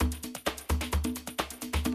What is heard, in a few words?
130, Classic DrumLoops